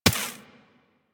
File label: Laser Impact Generic; Surface